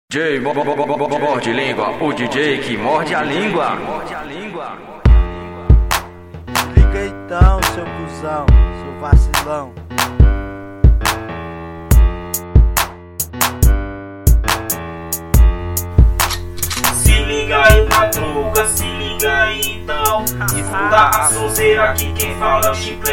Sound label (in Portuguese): rap